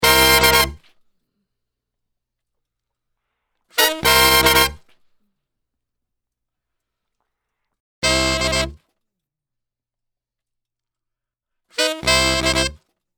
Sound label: Section and Saxes only